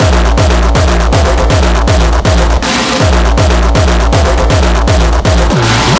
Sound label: Beats Loop; Anger Foot